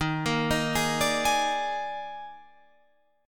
D sharp Major 7th Suspended 4th, D sharp, down, Guitar, Open F; arpeggiated